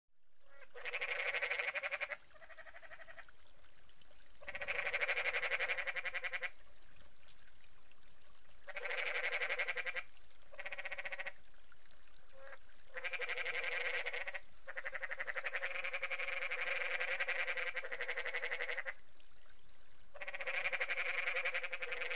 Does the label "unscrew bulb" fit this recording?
No